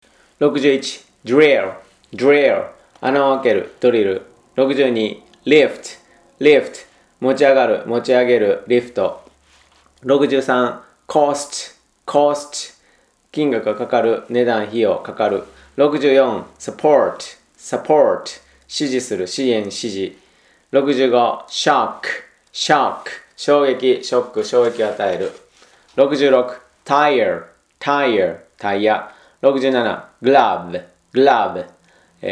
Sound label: tango